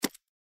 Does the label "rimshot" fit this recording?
No